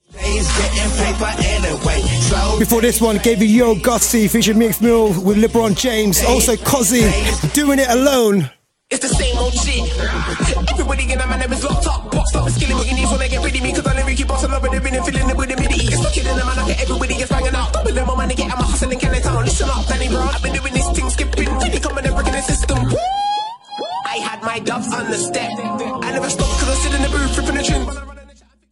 dubstep

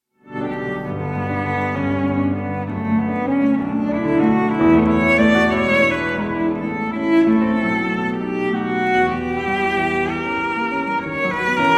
sonata in g minor for cello and piano; Chopin; op 65; 2nd movement

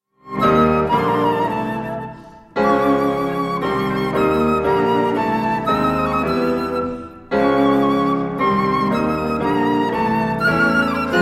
trio for flute cello and piano allegro; locrian ensemble richard arnell; op 168 2nd mvt; moderato

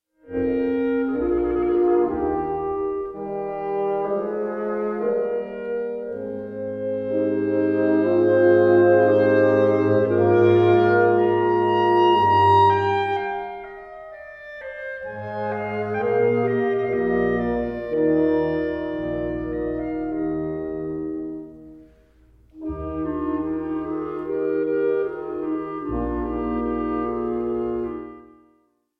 symphony for wind instruments in B flat major

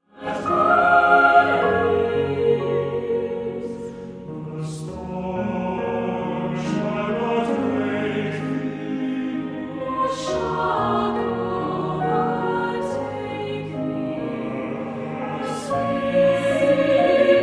lullaby for chorus and piano

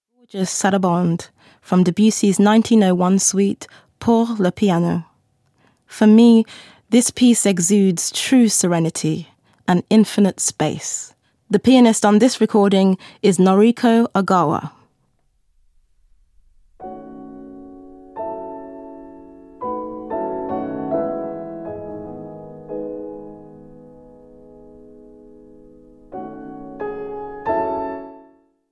pour le piano sarabande; claude debussy

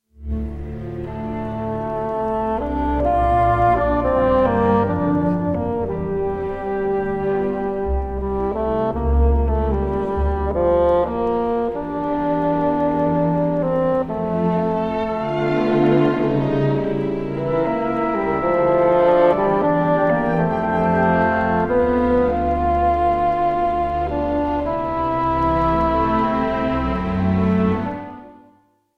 bassoon symphony orchestra; sir edward elgar; graham sheen bassoon; bbc symphony orchestra; sir andrew davis conductor; romance

